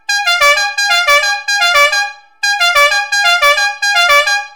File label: fiamm m4 il sorpasso novelty penumatic music horn trumpet bocina car van